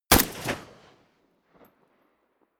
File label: rifle ak-47 exterior fire single close; comedy cartoon; pistolen gewehre; Pistols and guns